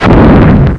Swooper Explosion

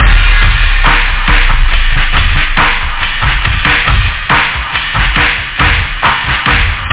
jungle-fastloop

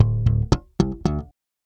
bass loop, Rock

